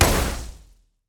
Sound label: shoot; EMXArms; emx umx32